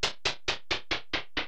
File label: stairs down start; zsdx